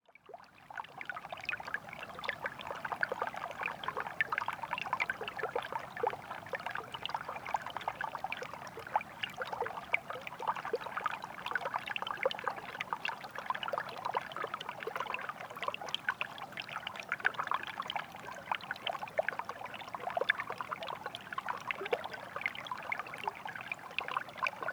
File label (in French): ruisseau glacé, parc national du mont tremblant; portrait sonore hivernal